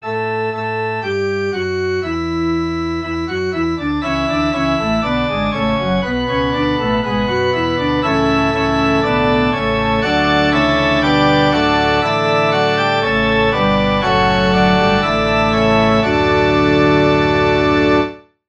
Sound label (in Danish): forspil; ddk 17 alt staar i guds faderhaand